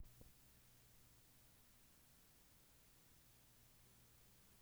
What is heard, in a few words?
melodica